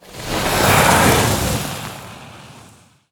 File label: Quicksand Elemental Play